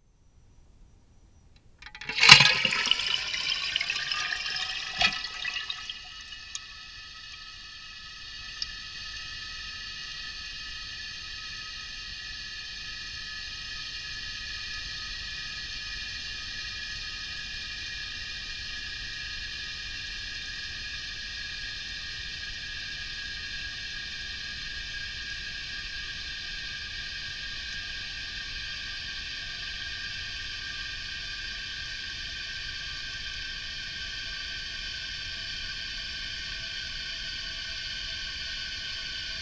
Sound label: toilet